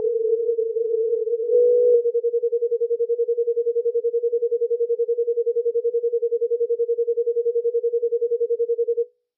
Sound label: R353 homing slow; spy